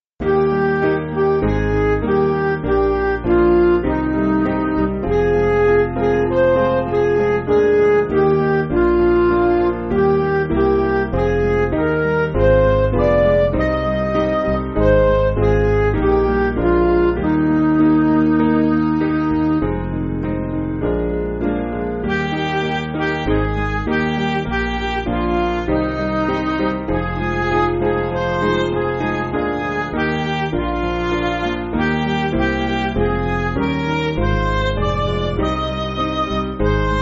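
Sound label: Music Backing Files for Churches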